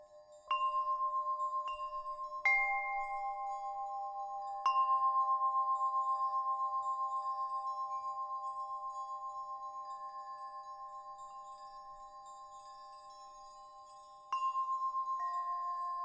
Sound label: Tibetan Healing